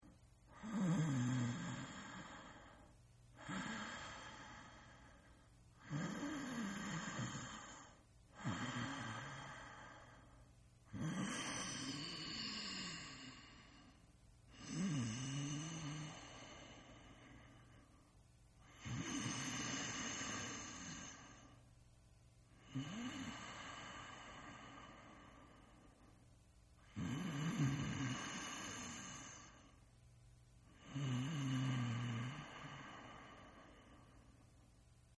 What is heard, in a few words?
Snore; Snoring; Sound Effects:Human; Partners In Rhyme